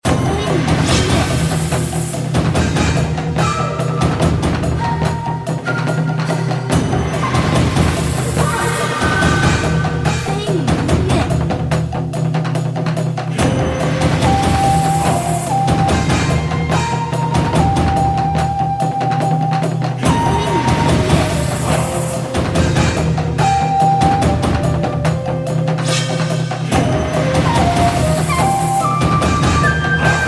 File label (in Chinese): 战斗 古风 激昂